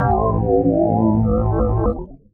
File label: JAM SYNTH, KEYS